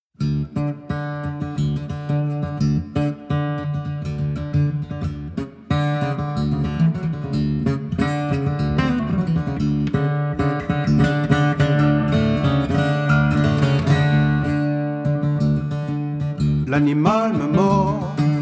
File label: Corps Vaudou